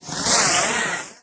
alien spray, weapons

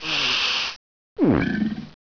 gator idle normal; gearbox

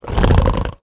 move; role4

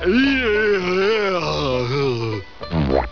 doh eeaauuhh; dod

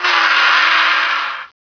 scream; gearbox

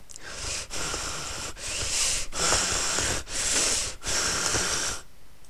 zombie event; damnedforest

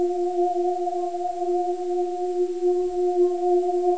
ooom

